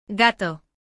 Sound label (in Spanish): GATO